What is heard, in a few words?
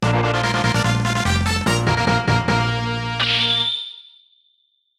receiving a special item